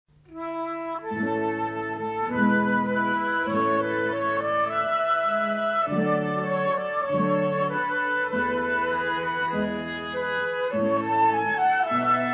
quartetto; El Greco

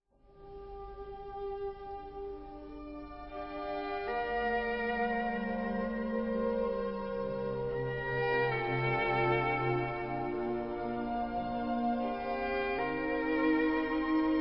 Chitarra E Archi; Tre Donne & Correva L'Anno Di

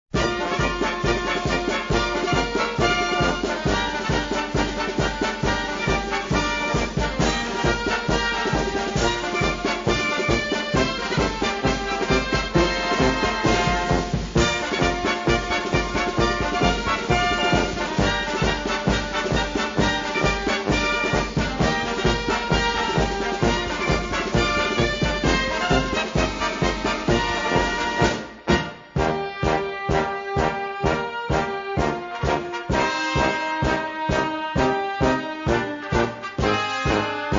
Brutta Fanfara

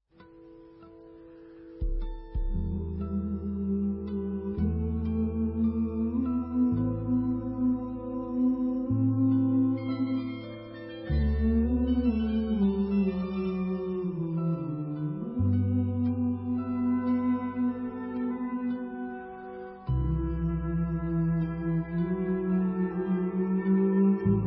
meditazione melodica

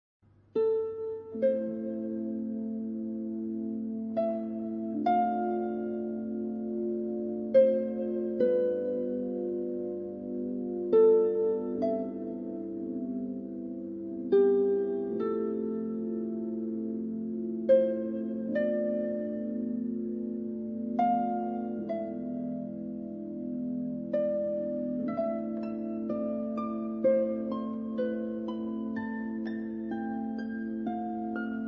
Arpa

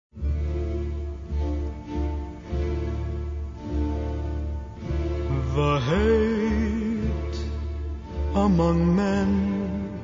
sung version; Per un pugno di dollari

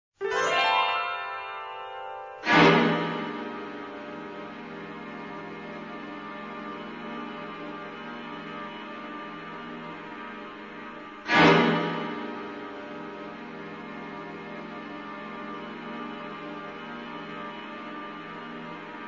Sequenza Suspence

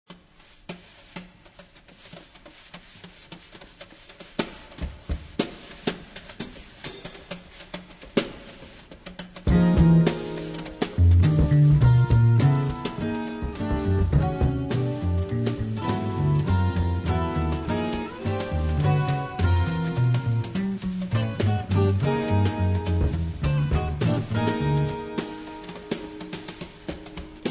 Psichedelico Jazzistico

